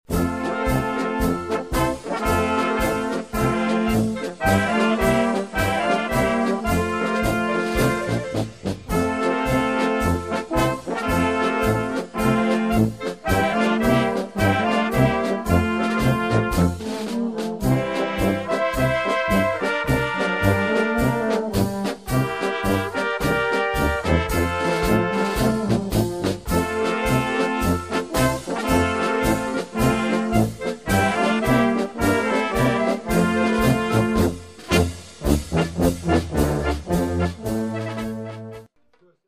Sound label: Polka